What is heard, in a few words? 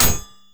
metal impact